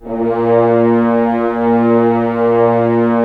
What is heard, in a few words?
Horns; Roland - LA Composer vol. 4